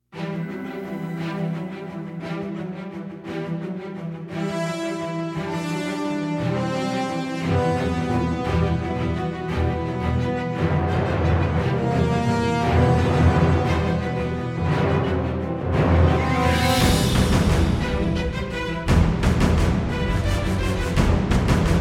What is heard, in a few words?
carol of the bells non choir no sleigh bells